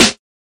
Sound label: Drum Snare